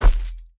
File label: Footsteps Dirt Walk; The Town